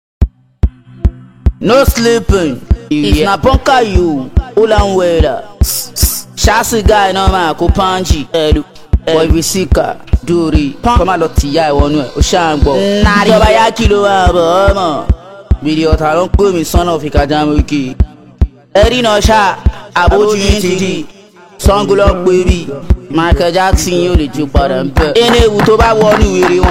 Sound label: Cruise Beat